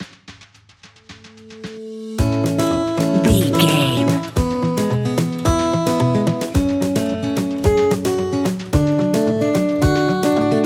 uplifting happy country

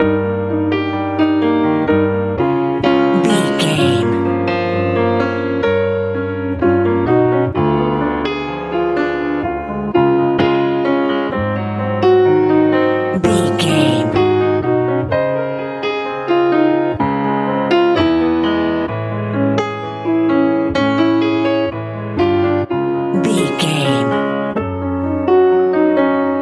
nice hopeful lite pop

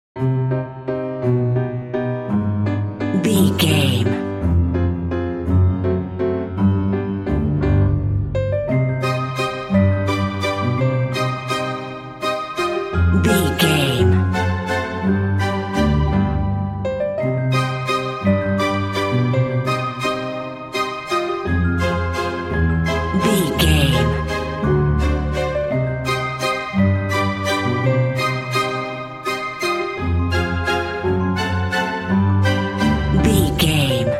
meeting mafia narrative

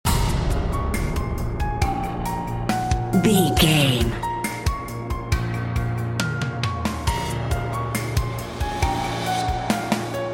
sinister foreshadowing bumper

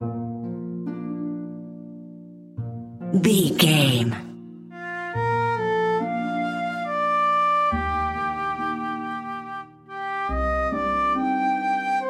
reminiscent loop

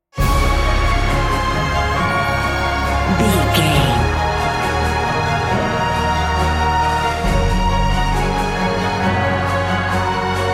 epic emotional

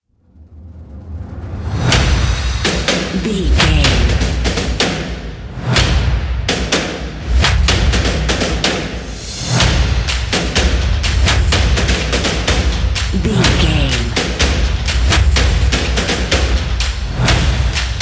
football march no chants

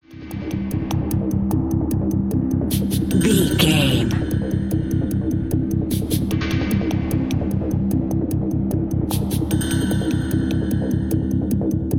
suspenseful ticking

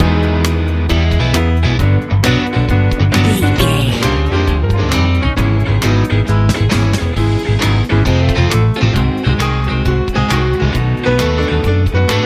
happy warm texas blues

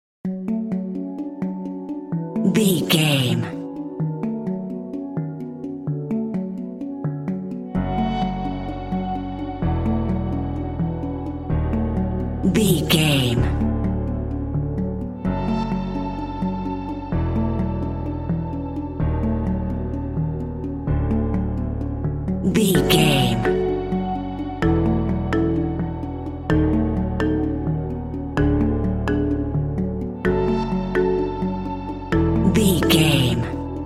warm ambient hang drum and mallets

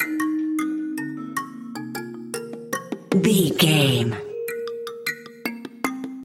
tribal expedition loop

